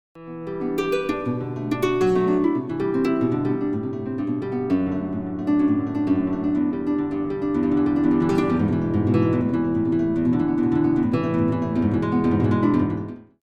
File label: nylon Accoustic Guitar